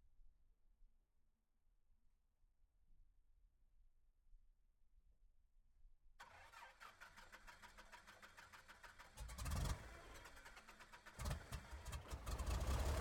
Extra 300 - ONBRD - IDLE - Start Stationary Idle Increase RPMs Off - ENGINE; Pole Position Production; Extra EA300